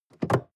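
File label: sports car interior seat handling medium; verkehr transport; autos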